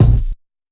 wild kick

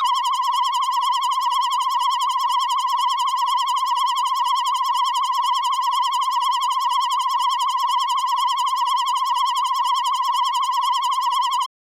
Arpeggio

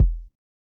Kicks

